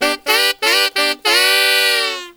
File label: SAX, W.AFR.SAX; Spectrasonics; Heart of Africa